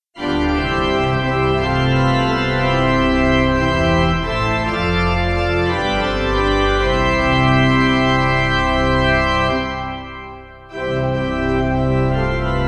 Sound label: Pipe